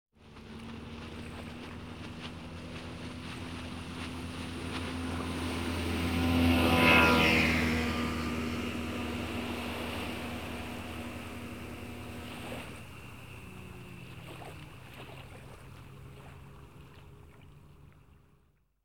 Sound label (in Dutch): Boot Passeert op hoge snelheid; KMP Film Video Sound Effects